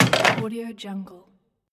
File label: hanging up rotary phone; yellowcatt